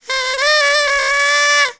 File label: FEST party horn, party People